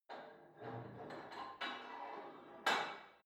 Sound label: put weight onto barbell unsteady reverby